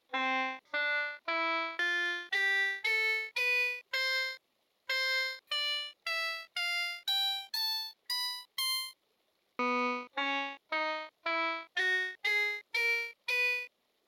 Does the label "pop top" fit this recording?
No